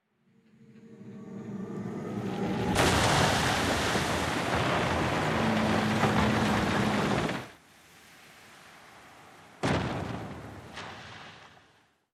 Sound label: Ship Appear